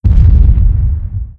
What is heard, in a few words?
hit boost, cannon; weapon; reaper